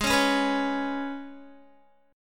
Ab Minor Double Flat 5th down Guitar; strummed; Abmbb5

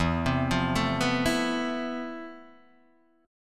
F Major Sharp 11th E

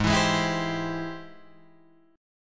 Ab Augmented Major 7th Ab Guitar Drop D

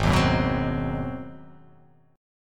G sharp 7th Sharp 9th

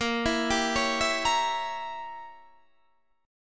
A sharp Diminished 7th Guitar Standard; 18,19,17,18,17,18; arpeggiated; JGuitar

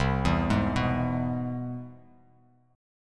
C-Augmented Major 7th-C-down-Bass Standard; arpeggiated; JGuitar

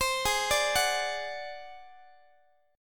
G sharp 7th Flat 5th G sharp down Guitar, chord; G#7b5; x,x,15,18,18,17; arpeggiated

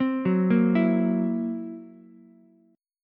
Fsharp 7th Flat 5th down Ukulele; arpeggiated; JGuitar

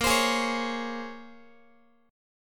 A sharp Minor 9th, A sharp, down, Guitar; A#m9 strummed; x,18,15,18,17,x

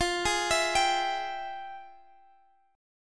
F Minor Major 9th, Guitar Half Step Down, chord

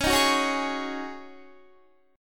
C sharp Suspended 2nd Suspended 4th, Guitar; C#sus2sus4; strummed; 20,18,18,18,20,21

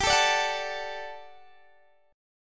G Major 7th Suspended 2nd G down Guitar DADGAD

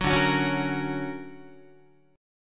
D Augmented Major 7th down Guitar

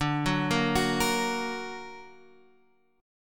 D Augmented D down Guitar Half Step Down

